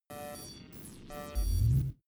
space searching computer

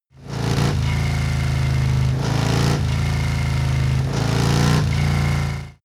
motorcycle harley engine rev triple

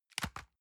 envelope drop multiple medium

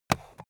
stapler drop

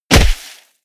body fall snow powder

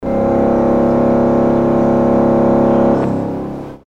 pipe organ fx

